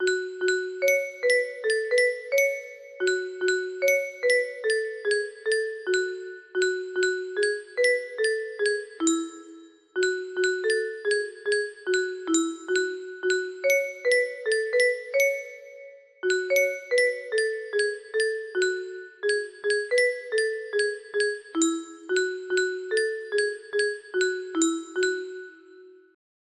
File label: music box